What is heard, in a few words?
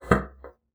BASSOON; Xsample VOL-6